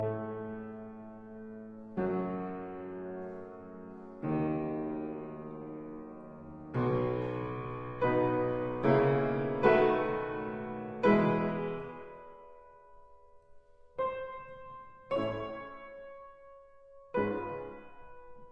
Keyboard Grande Sonata in G minor, Adagio molto expressivo; Op 39